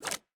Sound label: pick a card flip; LoL; twistedfate